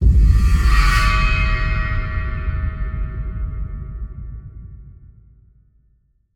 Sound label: cinematic horror trailer long sweep